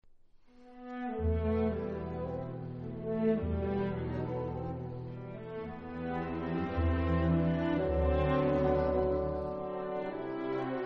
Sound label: piano Reverie; Concertante